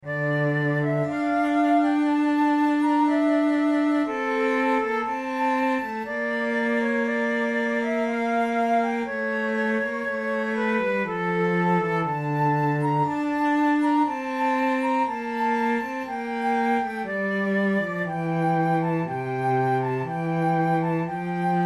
recorder sonata in f major largo; marcello op2 no12 iv

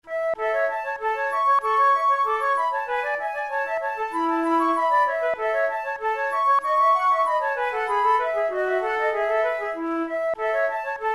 pour la flute duos allegretto

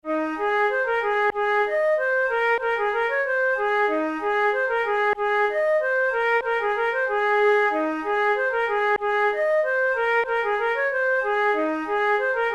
waltz, flute tunes; Miss Adams

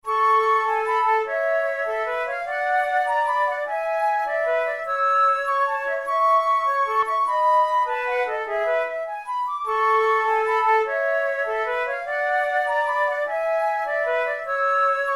duet in a major; kohler op93 no1 duet no9

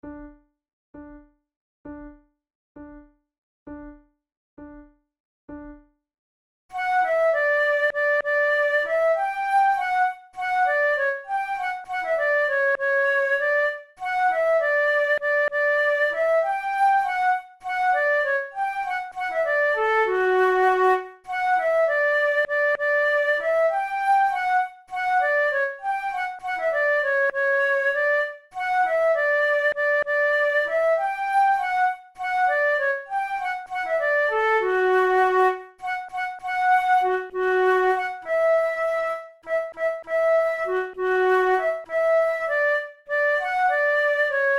duet, flute

